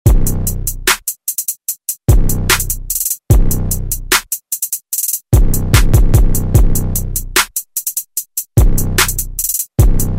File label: Pop; Deejay Hit; Redrum